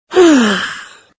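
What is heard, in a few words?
Female Relief; Extra Lives; Android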